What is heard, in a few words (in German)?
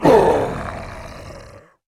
Monster